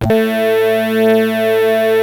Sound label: PPG A2, Rare Synthesizer; Hollywood Edge; Giorgio Moroder Rare Synthesizer Collection; PPG.2.3